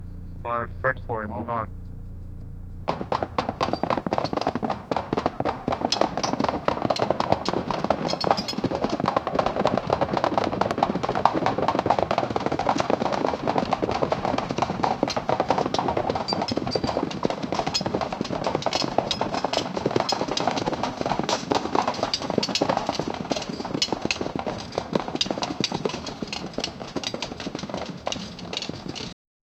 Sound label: distant cavalry riding ac, horses